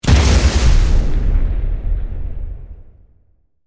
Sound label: boss explosion; kaliber